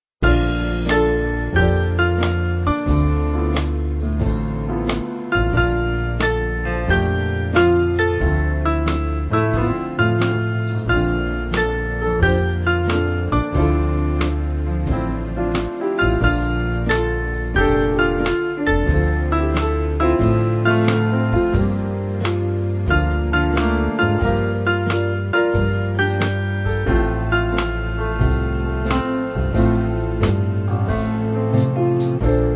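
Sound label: Band, Music Backing Files for Churches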